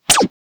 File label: sound effect of, block